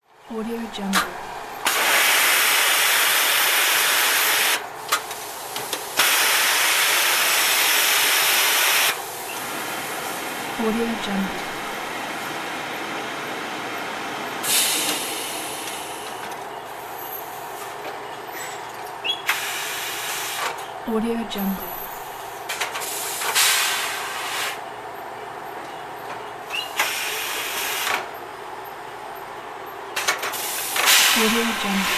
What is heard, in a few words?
dry cleaning